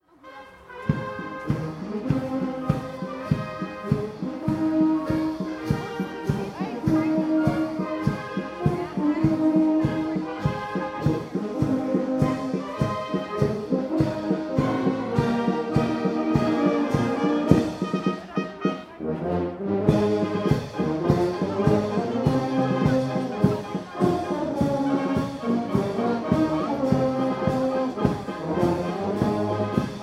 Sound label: brass band